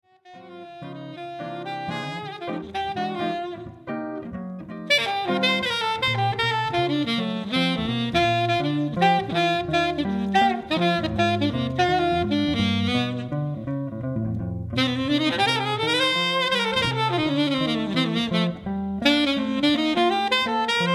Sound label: sax git duo